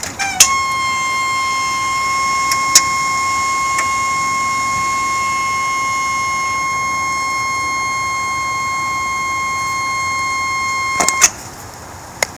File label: squeaking